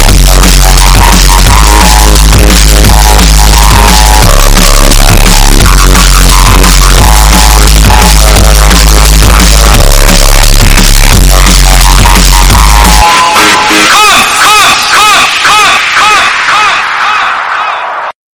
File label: hardcore flute